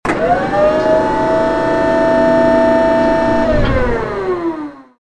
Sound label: gun elevate